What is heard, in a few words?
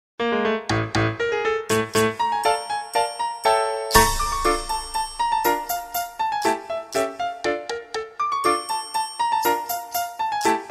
Hornpipe